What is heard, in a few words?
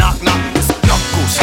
knock knock knuckles